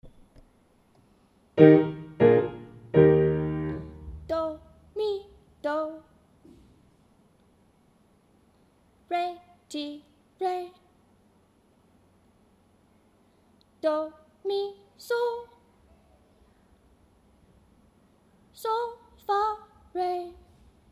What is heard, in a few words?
concert eb do tonic and dominant